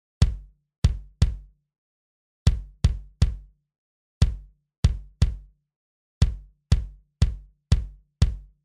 bass drum 10ms attack 400ms release compression solo